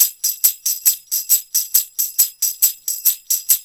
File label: Roots Reggae; Sounds Good - Roots Reggae